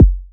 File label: kick; daily samples